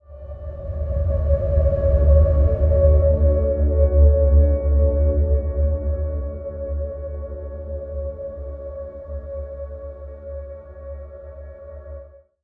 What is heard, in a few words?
Ambient Wave Single